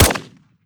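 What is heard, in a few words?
mike9a3 fire player, weapons; gmod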